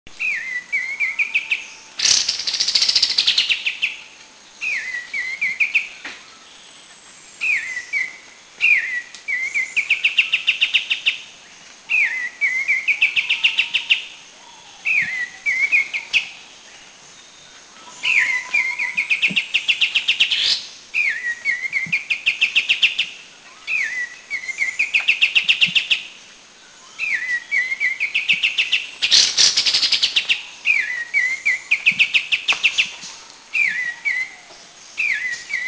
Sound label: Grey-cheeked Bulbul; Alophoixus bres; Thailand; Krung Ching